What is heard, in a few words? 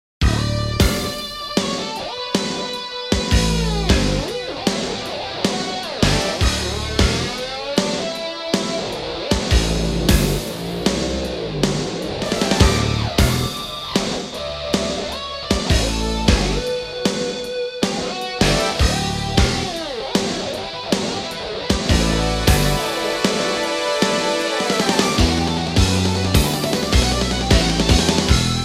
Guitar Jam